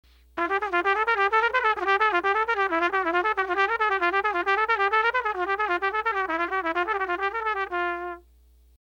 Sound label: articulation g